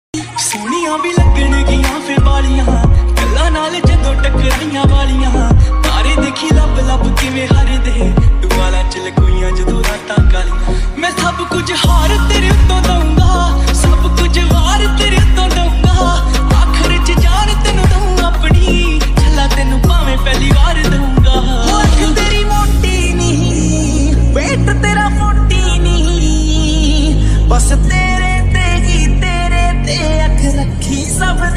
lofi ringtone

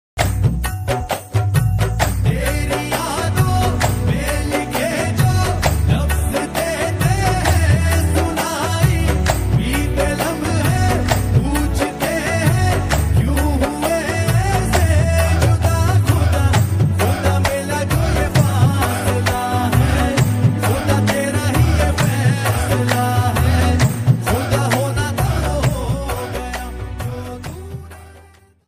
sad ringtone new song

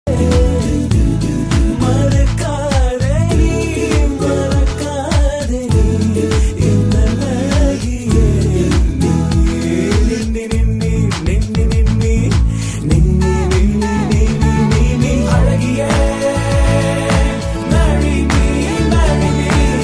tamil love song ringtone

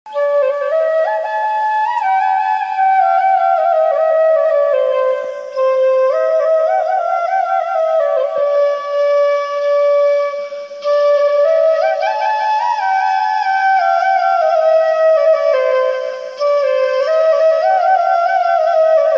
flute music and ringtone

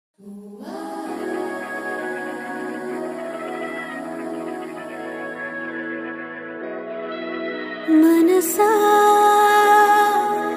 emotional song; kushi; vijaydevarakonda

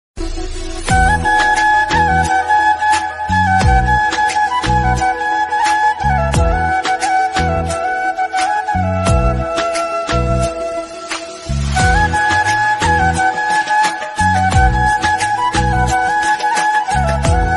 flute ringtones instrument ringtones; mobile ringtone; dimbe studios

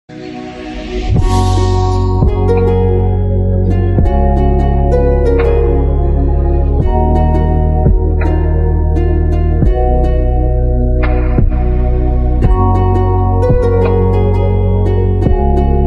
lofi instrumental ringtone

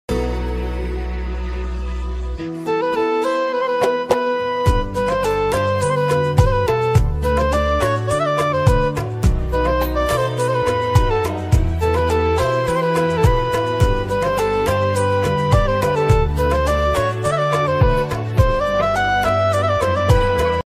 ringtone flute

